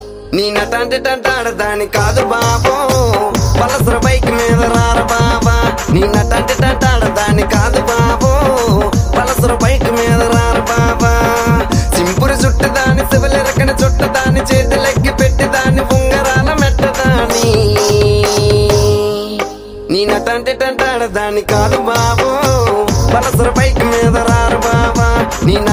Bike Male Version Song Ringtone BGM

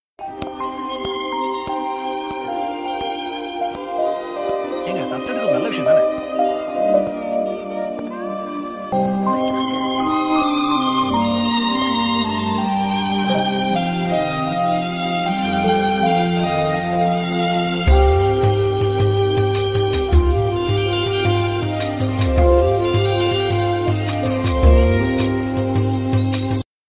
rhythm

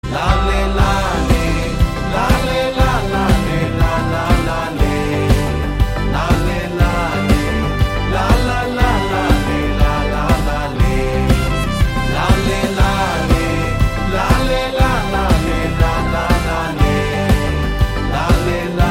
Humming

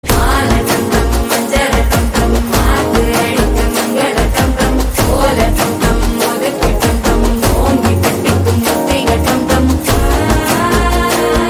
Tamil BGM; Enemy

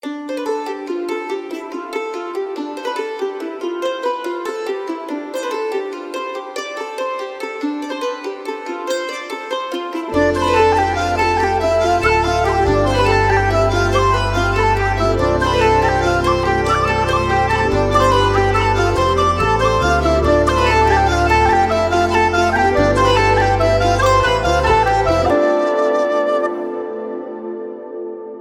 School Reopening BGM

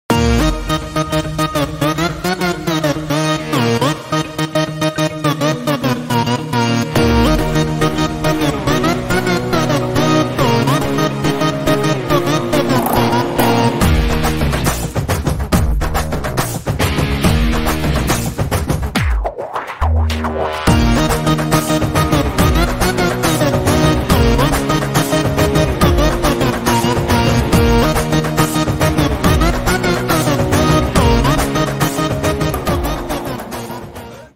Drum Beats

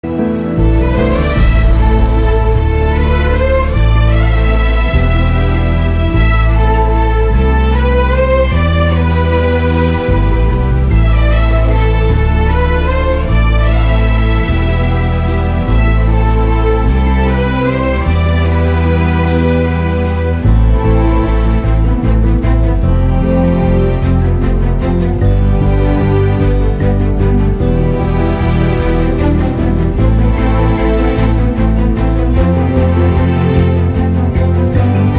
SAD Feeling BGM; Thiruchitrambalam

